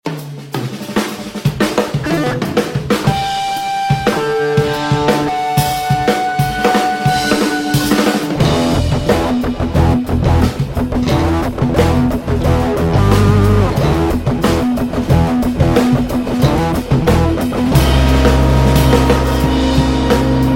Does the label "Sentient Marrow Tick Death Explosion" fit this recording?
No